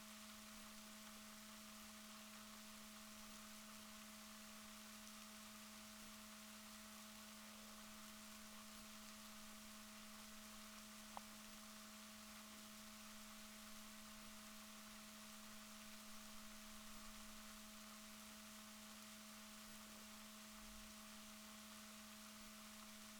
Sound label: rorquals positif, Balaenoptera Physalus Chile